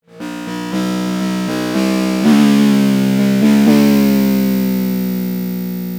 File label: snare